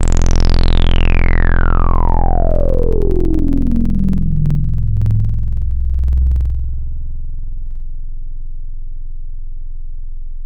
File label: sweep; Synthetic's Serum Collection